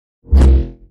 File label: beam knife, Weapon